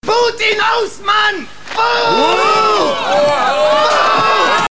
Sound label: boo